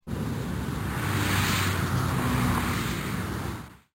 Trafic Low

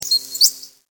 bobwhite

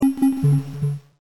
beep

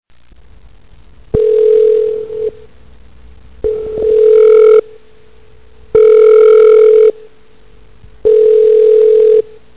FONE RING

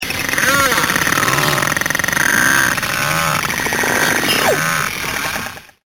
beluga whale

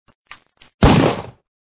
Gun Shot

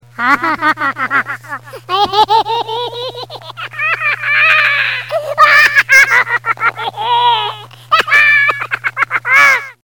laugh

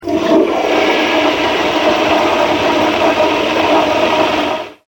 flush

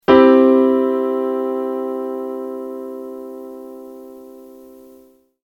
Chord Piano

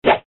Swing

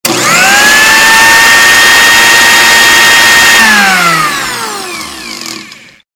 Cooking Blender

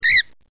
SQUEEZ

